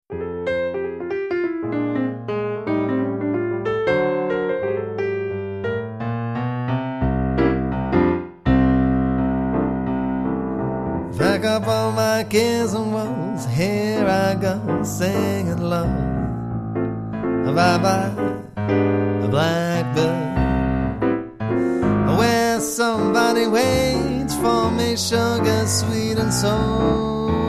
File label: pianoduo